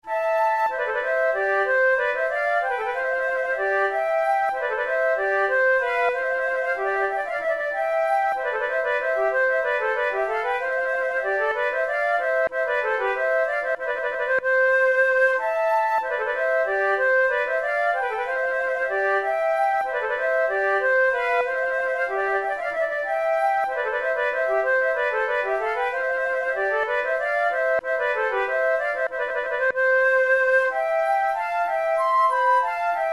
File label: musette en rondeau; Naudot; 6 babioles